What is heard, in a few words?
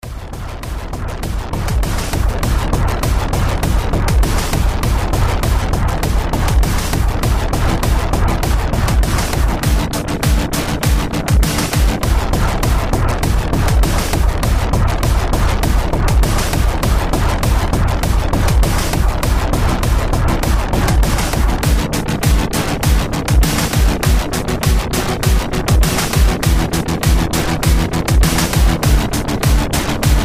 Boss Battle; TabGuantlet